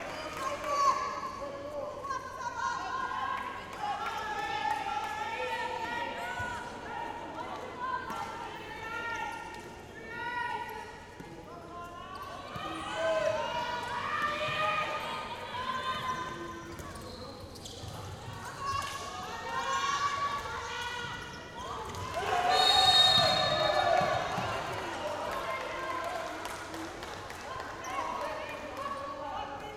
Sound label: Feminine European Cup Match, Handball Match Ambience; EHF